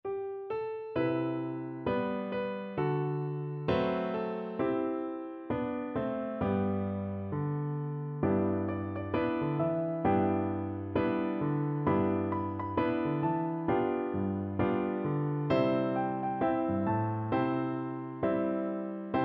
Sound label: this little light, piano duet